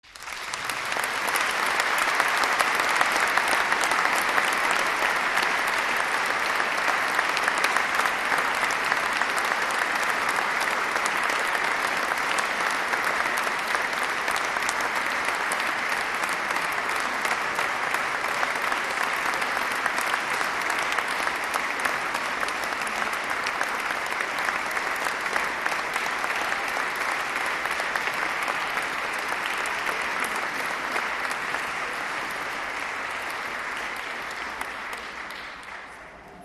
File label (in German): Applaus fuer Unterstufenchor und Chor; Konzertmitschnitt vom 20. Dezember 2016